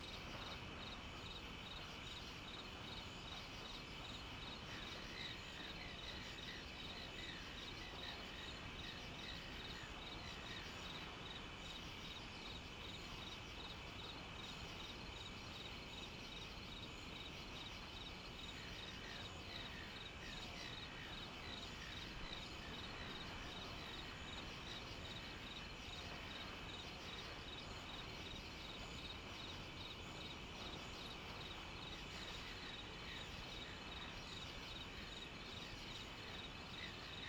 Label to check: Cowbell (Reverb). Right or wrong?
Wrong